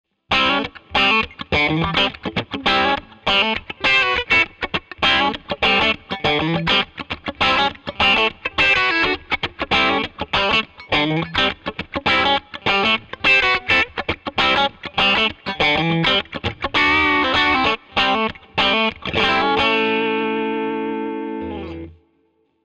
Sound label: 4th Position Funk